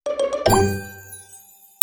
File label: time over; Dino-Jump